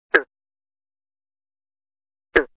Bullfrog; frogs toads; tadpoles amphibian

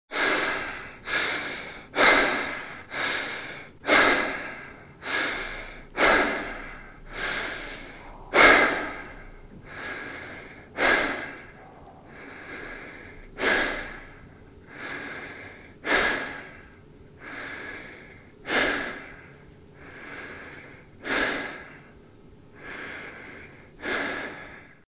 Male breathing; Lungs Breathing; Heart Beating

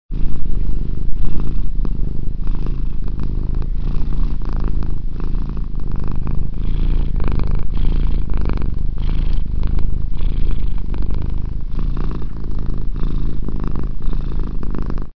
Cat purring

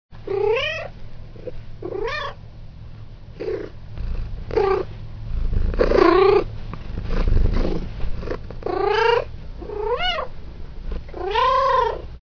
Inquisitive kitty

